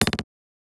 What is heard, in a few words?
Percussive Elements